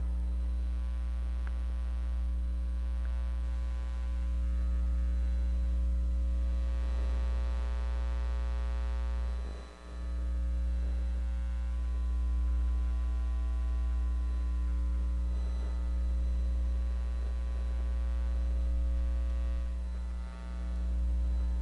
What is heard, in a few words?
noise emf hum buzz noise changing morphing loop; sonniss gdc